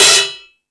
Rock N Roll, Drum Machines

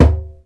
DJEMBE, ETHNIC PERC; InVision Interactive; Lightware VOL-1

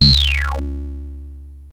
JUNO 60 G2

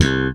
PULL C2, Bass; Sampleheads; Will Lee Bass Library; SPECTOR PULL

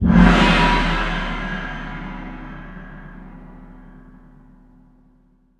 GONG; ILIO; Synclavier Percussion 2; World & Orchestral

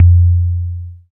BAS FM DX-7

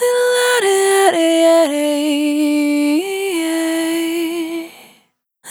Single Voice Melodic Loops, Vocal, Dry; Sony Creative Software; Pocket Diva World Class Vocal Samples; Discovery

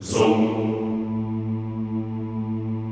ZOOMMM A1, Classical Choir; Best Service; Extended Classical Choir

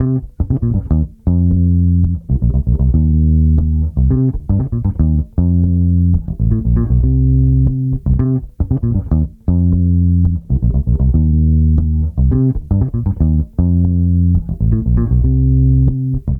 117 Cm DISCO BASS, 117 BPM

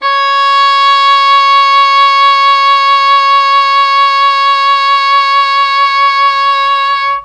English horn C#5; Q Up Arts; Denny Jaeger Private Collection

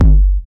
BD, BD Dance; Sound & Vision; Gigapack I CD 1

